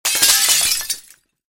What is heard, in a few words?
tray drop